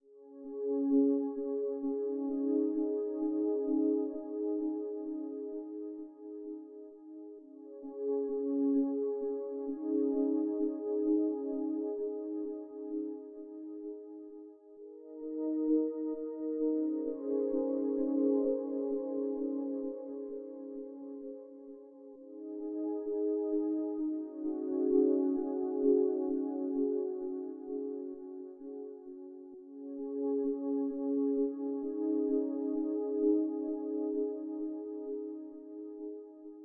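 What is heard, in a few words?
music startscreen; Ellipsis; Android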